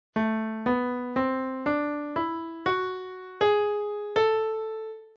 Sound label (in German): Tonleiter a Mel Leitton; die moll-tonarten